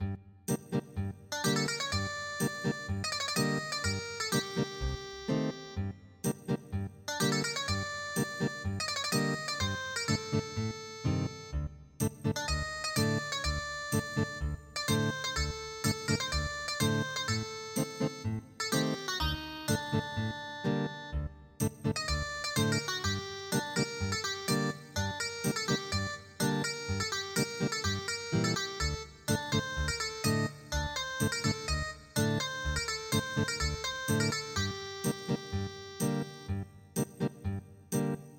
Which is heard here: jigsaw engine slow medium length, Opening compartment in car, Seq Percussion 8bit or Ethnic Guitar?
Ethnic Guitar